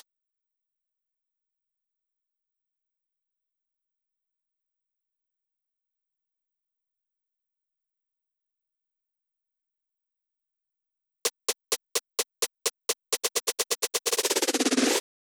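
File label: Drum Loop 128 BPM snare; Harlem; VEC5 Multi Drum Loop 50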